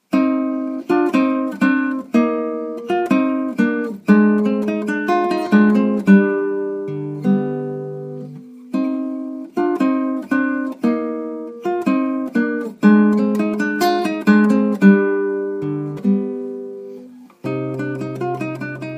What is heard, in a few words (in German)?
Musik, Gitarre